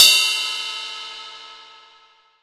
ZIL RIDE; 18 ZIL RIDE

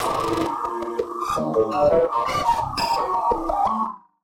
Casio, Glitched, malfunctioning synth

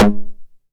909 SD Tape Sat

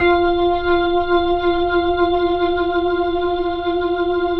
HAMMOND 3F L; 3D Sound; 3D Keys VOL-1

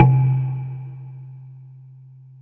KALIMBA C2; KARAMBA

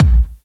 BD, Dance Mega Drums; Best Service

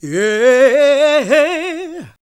GOSP. Singin

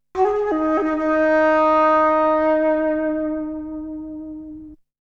Flute; ZERO G - Datafile DISC 1

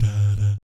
BS DADAT, Vocal Jazz FX; Spectrasonics; Vocal Planet; JAZZCOMBO

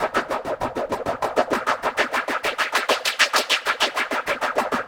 Echo Chuff Splat, Synthetic Pulses

